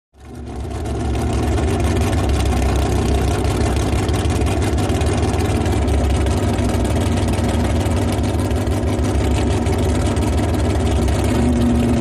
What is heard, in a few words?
Drag Boat Idle revs